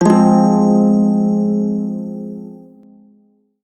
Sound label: Shawzin Phrygian Chord; Warframe; Prime Shawzin